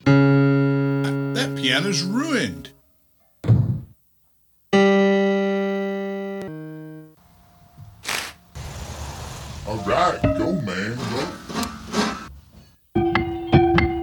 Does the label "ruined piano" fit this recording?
Yes